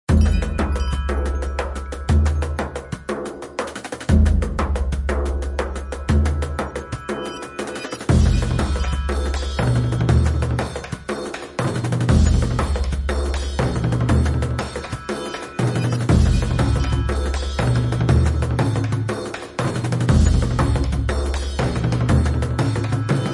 Percussion; Fired Earth Music

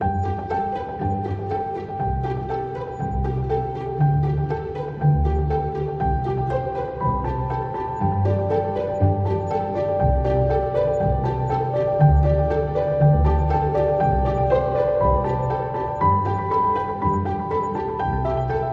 Simple Ambient

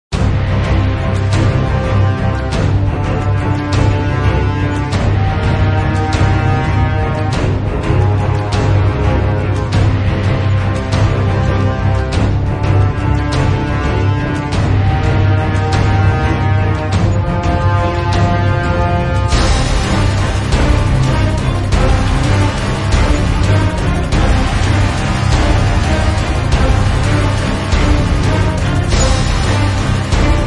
No Vocals, Epic Action & Adventure

